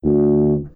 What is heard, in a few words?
tuba note